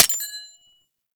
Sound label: Mech, Weapons; Tenno; AK Bolto Prime